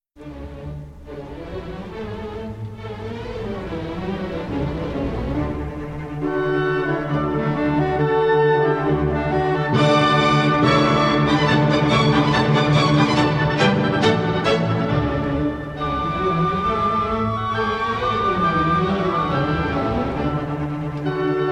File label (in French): Les noces de Figaro instrumentale